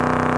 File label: Hotrod; Demo Man